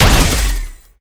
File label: click